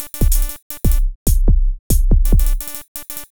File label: break tweaking